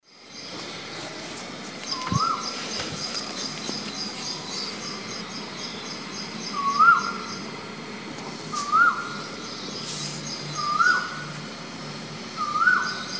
call of the koel bird at indore

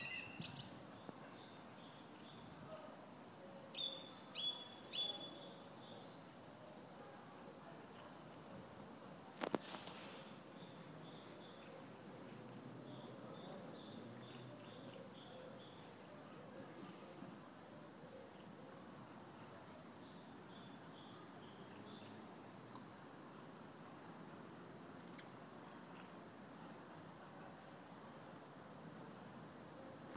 birdsong in church, Norwich; st peter parmentergate

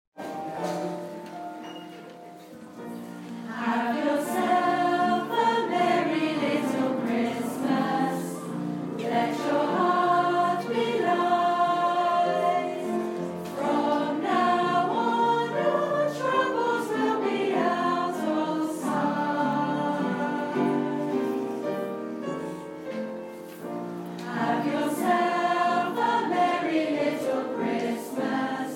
teachers choir; montpelier

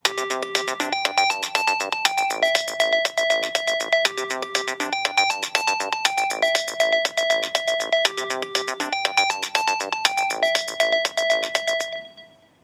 beat

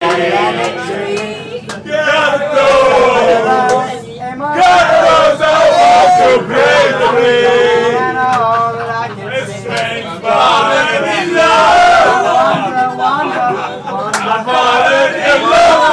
night bus party

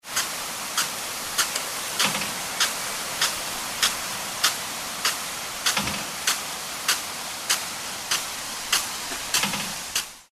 large farm sprinkler; suffolk

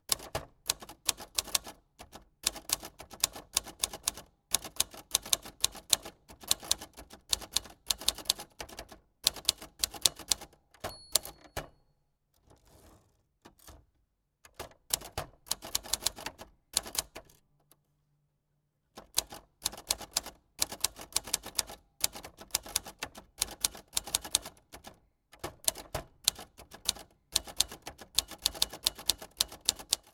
Monpti typewriter

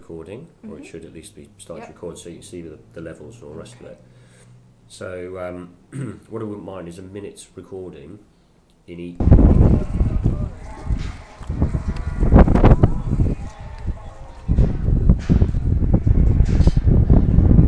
sheep market

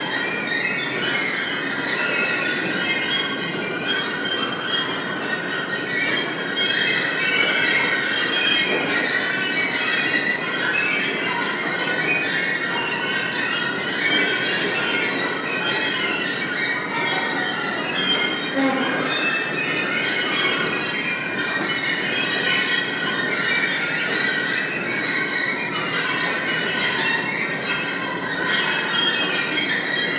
escalator etude

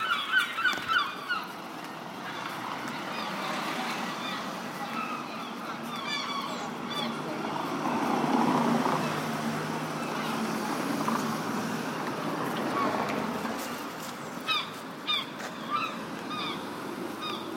seagulls; ridlington

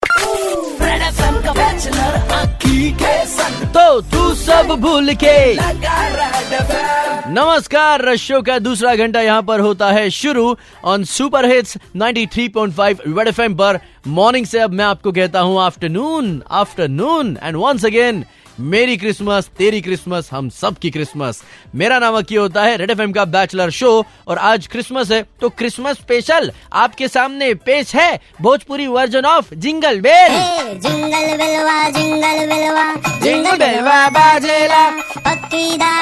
bhojpuri version of jingle bells

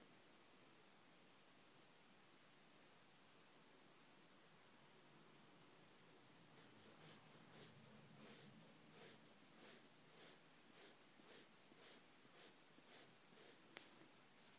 quiet

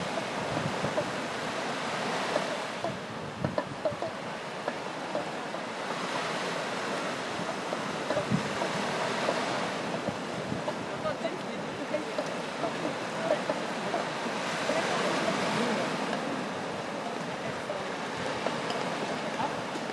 levantine water lapping w tel aviv paddle balls

